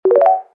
health Boost; Food Farmer

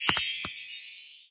swipe out; run-dorothy

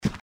footstep; Keep Out! A Dungeon Adventure for the Brave

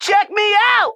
idle, Truck; Nickelodeon - Destruction Truck Derby; mikey

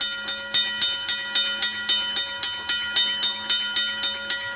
railroad crossing; Bugs Bunny - Carrot Chase

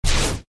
boss get hit; Adventure Time - Break The Worm; ThrillGames

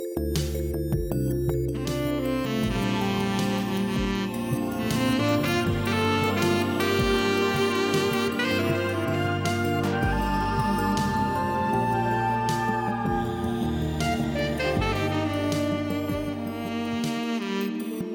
candy music; Adventure Time - Elemental; ThrillGames

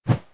swish, basketball; Nickelodeon Basketball Stars 3; ThrillGames

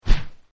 popup; Keep Out! A Dungeon Adventure for the Brave

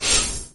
door close; Gotham City Speed

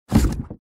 fire; Ben 10 - Tomb Of Doom

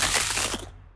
maggot unfreeze; Ben 10 - Omnicode

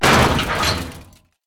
Smash, Truck; Nickelodeon - Destruction Truck Derby

